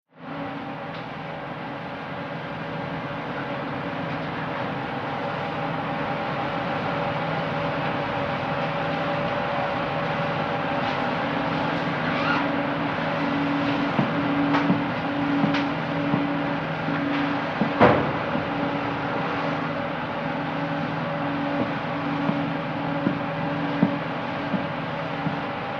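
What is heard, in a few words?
Battleship Interior Bridge, ships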